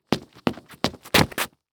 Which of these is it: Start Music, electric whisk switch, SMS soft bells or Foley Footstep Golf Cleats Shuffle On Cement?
Foley Footstep Golf Cleats Shuffle On Cement